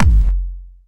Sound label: normal hit clap